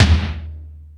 Lazer Drums, E-MU Pro-Cussion; drum machine

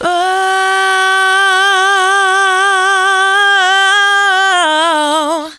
ad lib ohh, female vocals; PlatinumPhattCD2; E-MU Producer Series Vol. 8 – Platinum Phatt

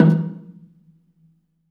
bela D#2, belafon; USB Soundscan vol.60; AKAI

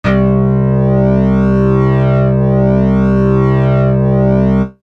Synth; AMG

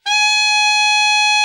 ALT, Sax, ALT.VEL-FALL; Giga Samples Collection

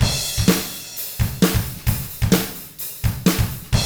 HARD ROCK, Drums

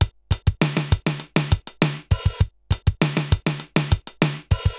RX17 (100BPM), cyborg beats; musicradar

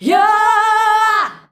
"YOOOAAH" A, FEMALES; Best Service; Hyper Dance

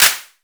CLAP ELEC; Crypton - DrumBase5000

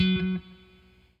ROCK, Guitar

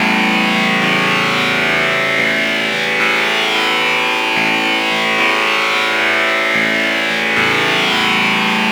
Ambient Melody; Melodics; Drums & Drones

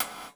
PERC GATED; Crypton; DrumBase5000

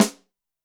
snare, Zoom MRT-3B

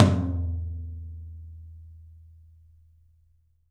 TOM; 3D Sound; 3D Drums

